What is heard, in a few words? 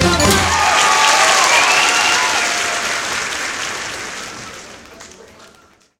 Ui Place; Talking Tom Gold Run